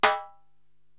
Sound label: Timbale Hi Rim, Korg TR-Rack